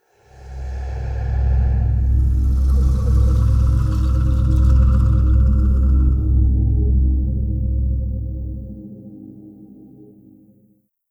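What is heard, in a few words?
Woosh Music; from dust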